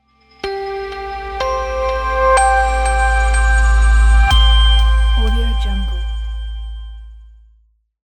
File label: mallet ambient logo